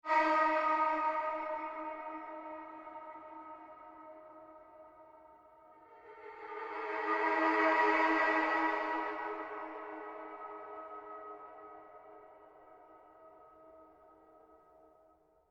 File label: SURGE FADE, Sound effects created by manipulating other sounds; Rises and Dives